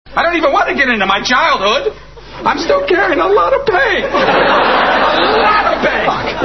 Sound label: pain